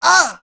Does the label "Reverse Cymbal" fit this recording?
No